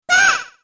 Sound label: hurt; snes; smas; chibimoon